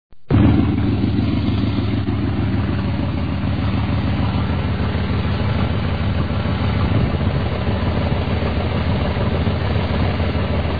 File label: Engine; 74 charger